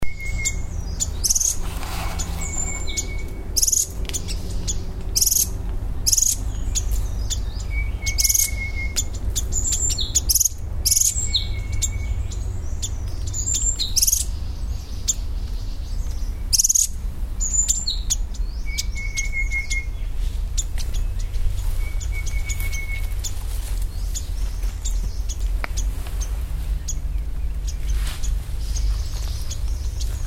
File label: parrotbill golden Suthora verreauxi